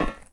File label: metal, footsteps